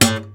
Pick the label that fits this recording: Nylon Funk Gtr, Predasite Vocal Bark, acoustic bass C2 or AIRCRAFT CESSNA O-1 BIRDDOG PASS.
Nylon Funk Gtr